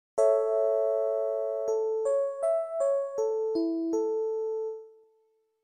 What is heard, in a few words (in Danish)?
skala a